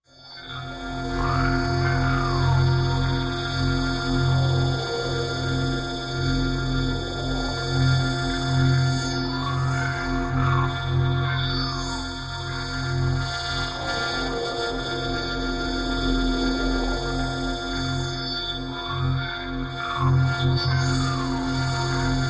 Broken Force Slw Moving, energy beams

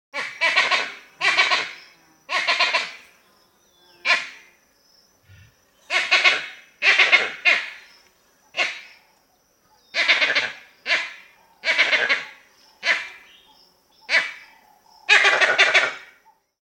Monkey Vervet Calls, vervet; Loud, Reverberant Calls In Wild. Medium Perspective.

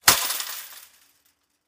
Chain Link Fence Gate Drop on Another Fence

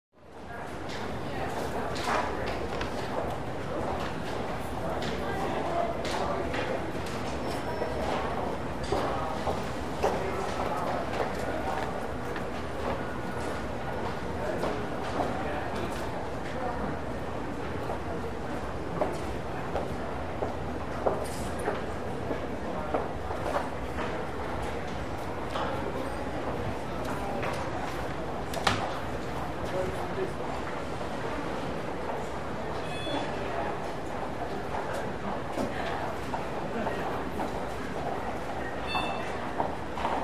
Shopping Mall Ambien

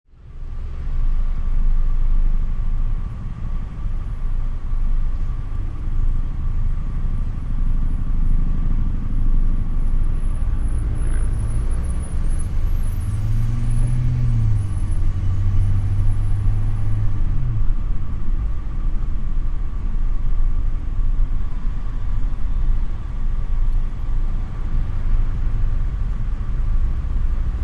City Traffic Roar; big city

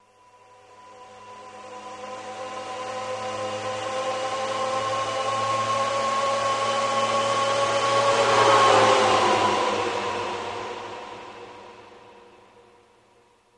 Torpedo Jet By, torpedos

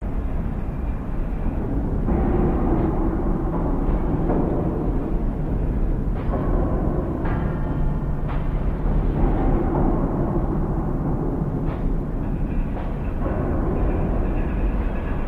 Railroad Hump Yard, rail yards dist

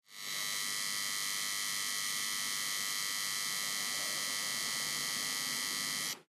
Electricity Buzz Thin, buzz & hums

